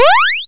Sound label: powerup; jumping santa